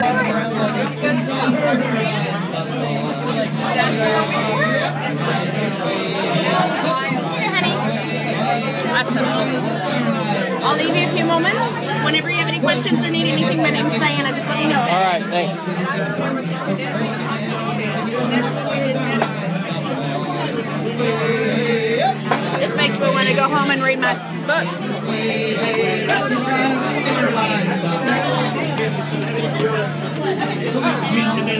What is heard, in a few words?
irish pub